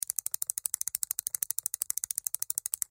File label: wait Run